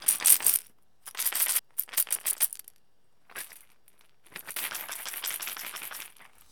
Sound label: Percussion Native Shaker; SFX Kit; Foley - Percussion